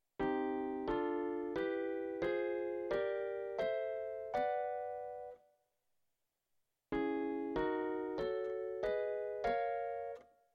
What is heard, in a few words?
minor, jazz piano